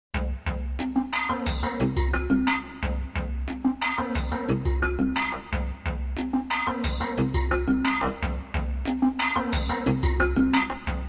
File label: Rhythm